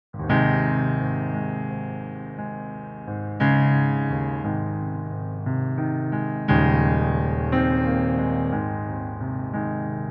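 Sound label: piano playback Ab major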